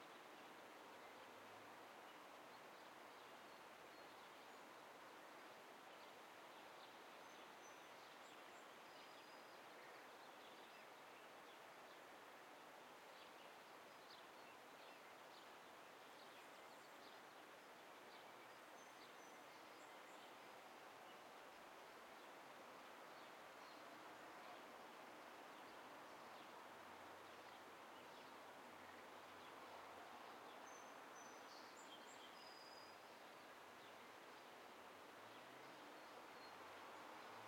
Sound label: amb